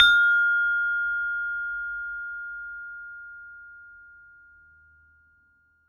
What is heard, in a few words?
Saron P, Gamelan